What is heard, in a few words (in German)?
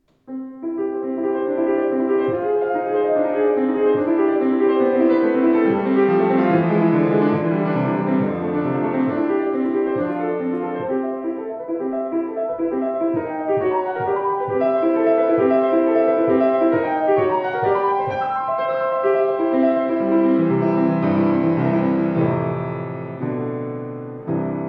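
Yamaha C3, Flügel; 186cm-c3-fluegel-2003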